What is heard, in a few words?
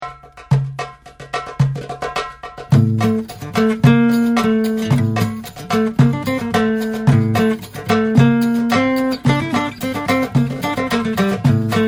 Maqsum Belly Dance, guitar